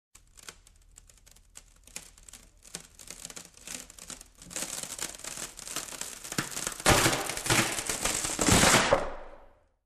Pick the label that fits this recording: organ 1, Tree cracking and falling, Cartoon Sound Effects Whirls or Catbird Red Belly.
Tree cracking and falling